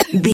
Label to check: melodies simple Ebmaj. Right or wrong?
Wrong